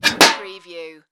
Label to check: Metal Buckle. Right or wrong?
Right